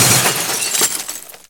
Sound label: special end